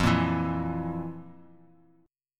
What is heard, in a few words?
F Major 7th Suspended 4th, chord; strummed; x,8,8,9; JGuitar